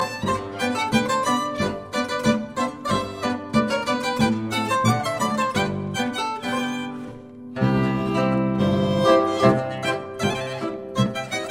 Polka, zither; Hancicka Polka

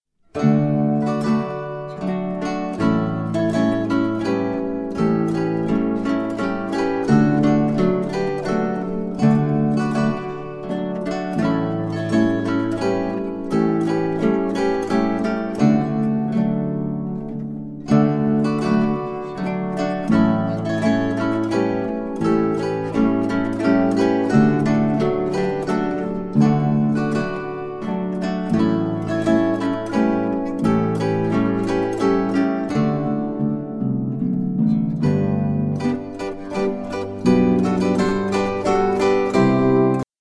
Bauernmenuett, zither

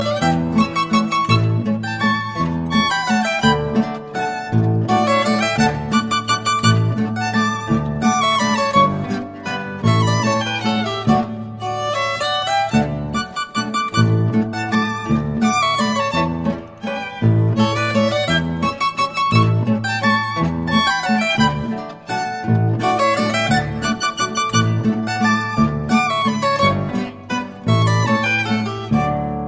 Landler, zither; Landler Chudiwa

